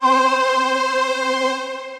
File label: Hi Strings Voice Layered, String Machines, Roland VP-330; Ableton; Factory Packs; Retro Synths